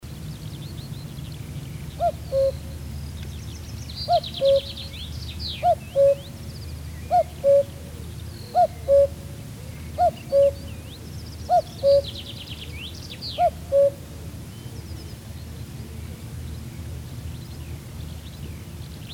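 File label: obyknovennaya kukushka golos, common cuckoo voice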